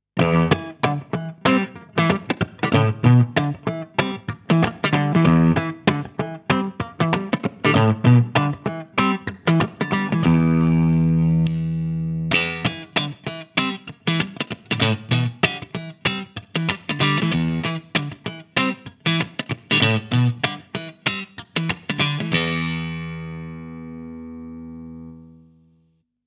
Clean Neck Pickup; Chapman Guitars Bea

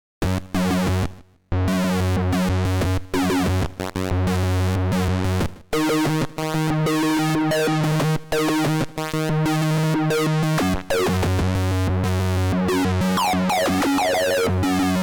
Osc Sync

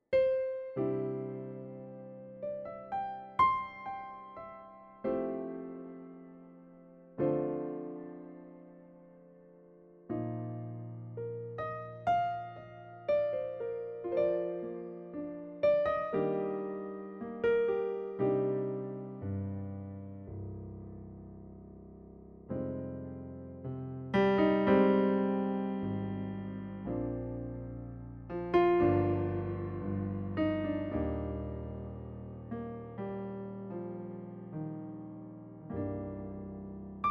Piano

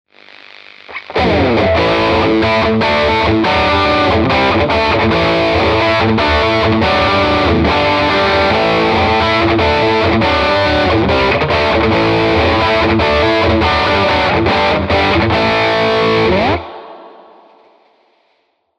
AC Box Combo; iAxe 629; Stradaboxius

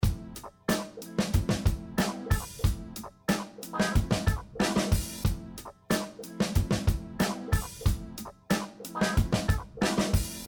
4 Loops 3 Voice

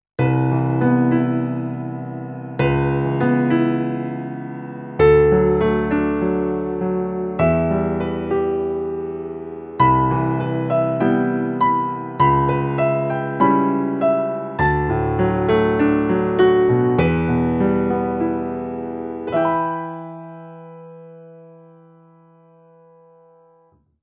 Vivo S7 Vivo Grand

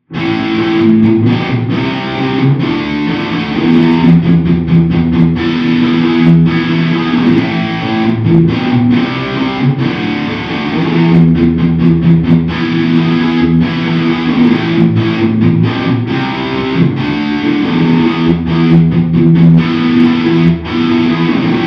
Room only Bathroom 414

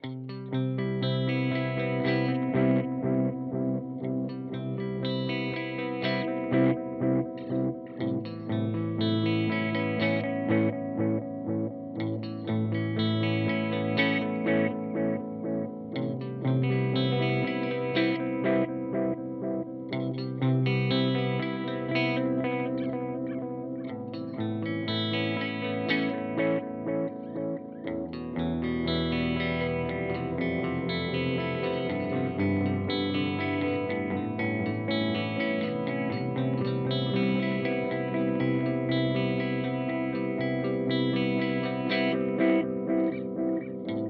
JHS Panther Cub V2

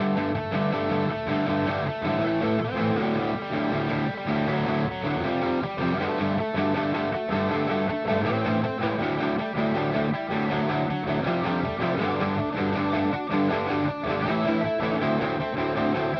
Boss GT-1000 Core Tubescreamer 808; Vergleichstest

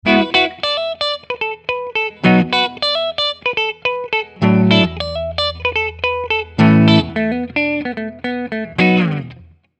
Gibson Robot LP Neck Bridge CL

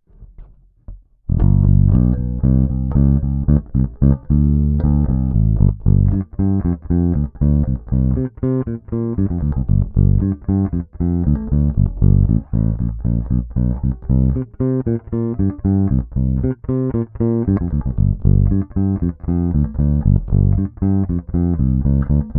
Savannah Asat Bass Fingerstyle

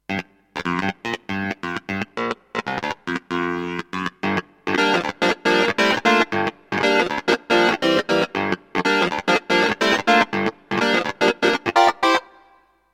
Clavinet Release Key Off Trigger

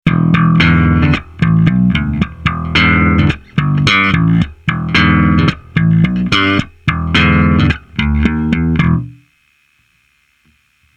JD-150 J-Bass

